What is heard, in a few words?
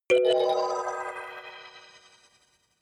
notification kalimba magical fourth